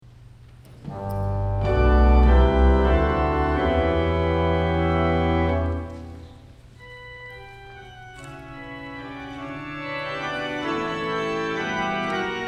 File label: Organ Fantasy